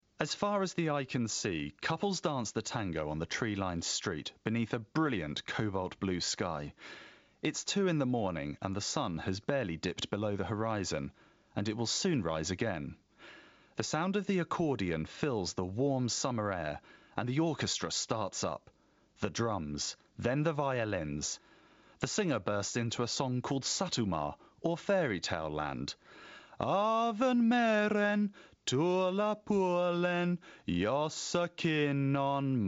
sultry music; in chilly climes

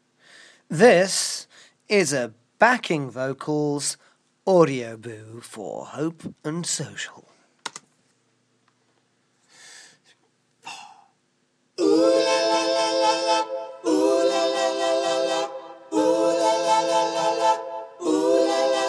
backing vocal

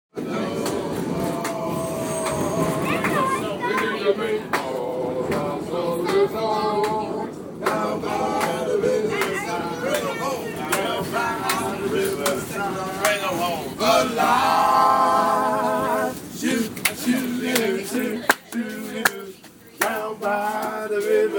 nyc subway music

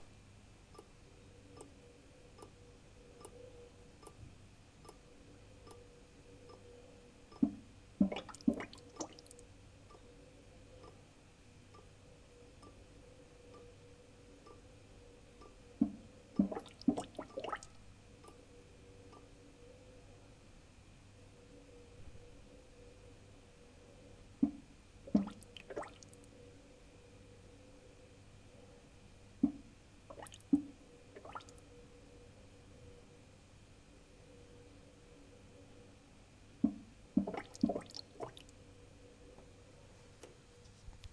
tick follows tock gurgle

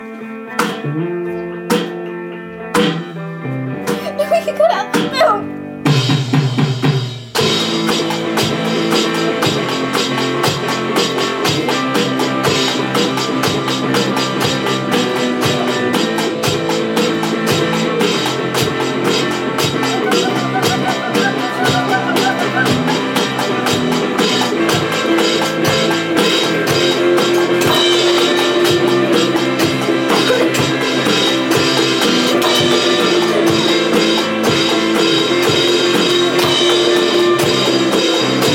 drum performance, sorry about speaking

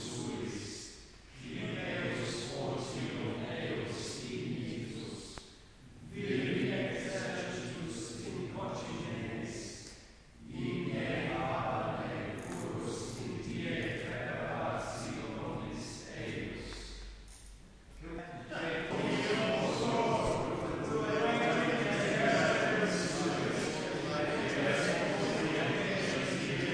scary doom latin

